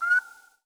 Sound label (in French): oiseau de nuit